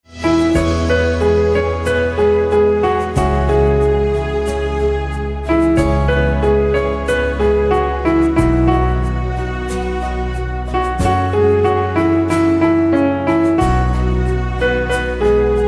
Piano, instrumental ringtone

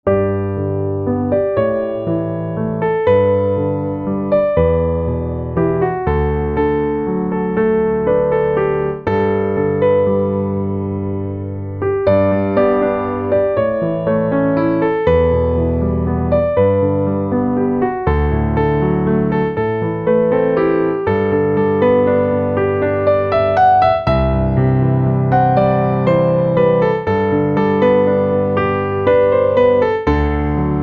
Piano, instrumental ringtone

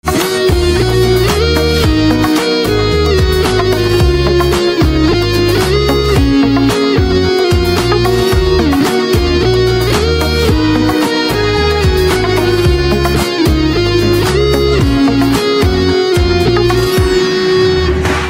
Electric Guitar

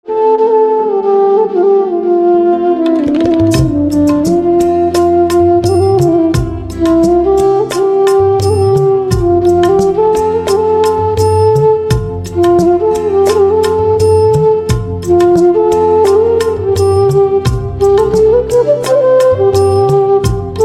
Flute

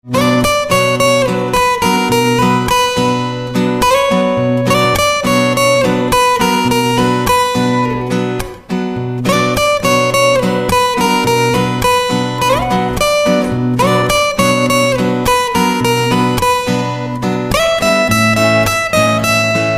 Guitar

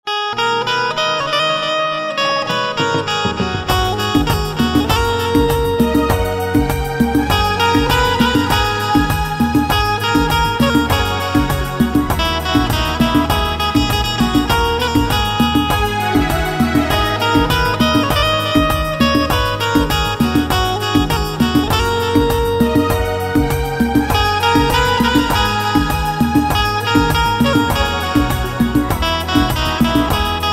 Banjo, instrumental ringtones

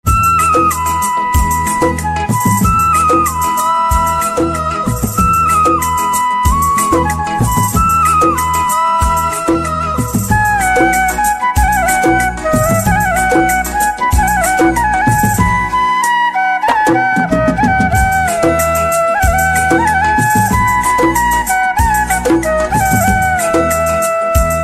Flute

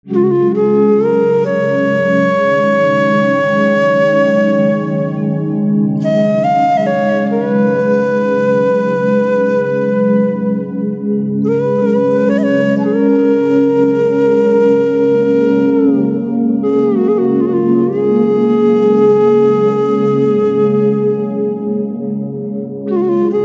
Flute, instrumental ringtones; phir se dhurandhar the revenge

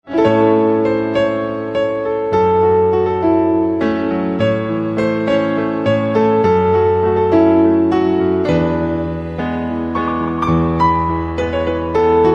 Piano

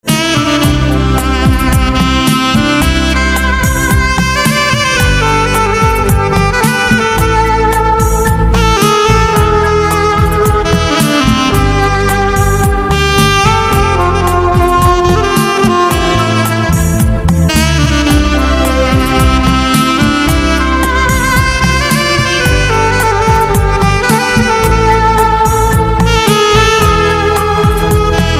Saxophone

